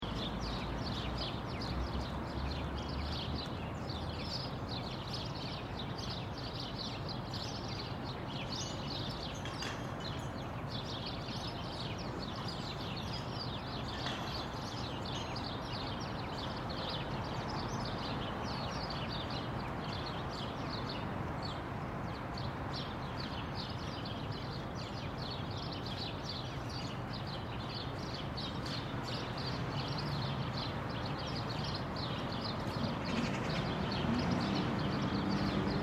Birds Construction Machine, Construction in city. Many small birds singing beside road with li; Rattle and crane engine from construction nea